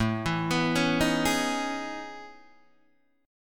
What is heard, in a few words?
A Major Sharp 11th, Guitar DADGAD